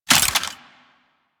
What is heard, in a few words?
Rifle Reload; Warframe; Weapons; Thanotech